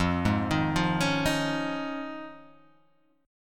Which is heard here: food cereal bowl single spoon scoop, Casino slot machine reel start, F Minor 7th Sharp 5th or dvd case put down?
F Minor 7th Sharp 5th